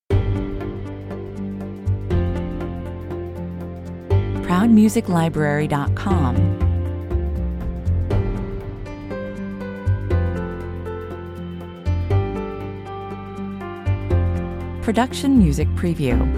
scenic drive 100 bpm, A mid-tempo, open and airy, dreamy soundtrack; ideal for evoking a serene, peaceful atmosphere. With a simple gentle piano melody